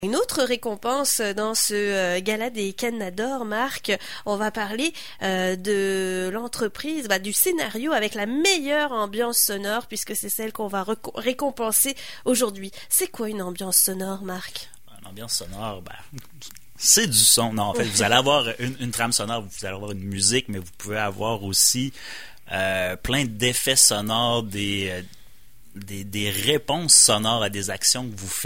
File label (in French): meilleure ambiance sonore gala; cadenas d'or 2019; Les Captivés